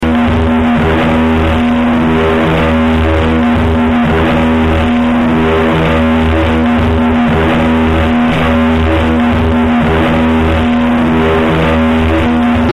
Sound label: Electrical Distortion; Various Intensities.